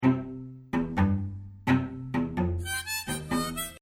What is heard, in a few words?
Indoor Intro; Indoors